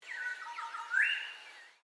Bird Grey Shrike-Thrush call, Australian Native Birds; Animals